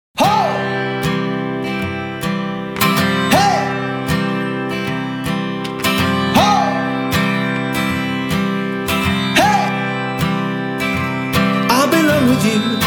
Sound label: Solo Acoustic